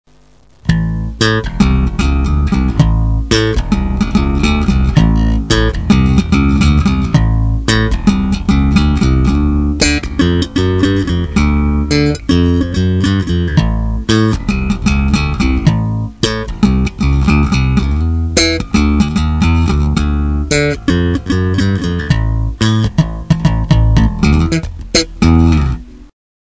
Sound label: Bassgroove